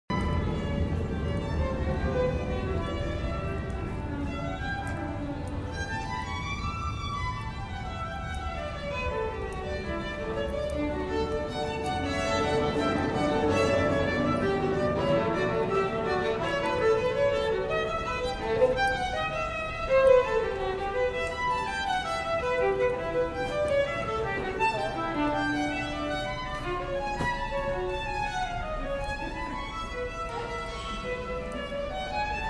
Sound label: violin 14th St station